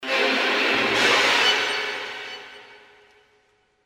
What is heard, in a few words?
Scary Things; LM3